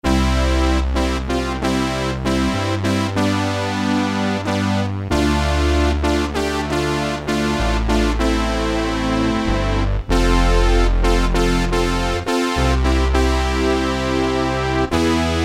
BRASS

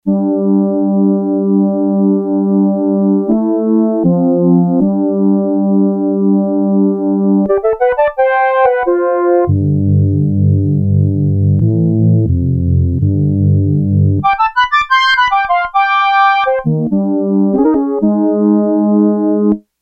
Fifths, Yamaha TX802; Factory Patches; Voices

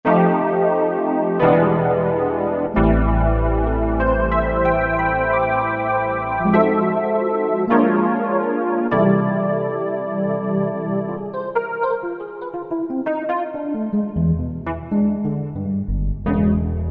Water Ep, Alesis Z1 Hip Hop

